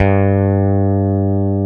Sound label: Basses, Roland